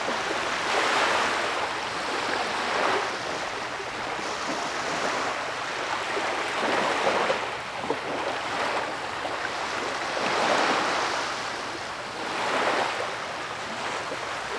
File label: Water Ocean Sand; GrandFantasia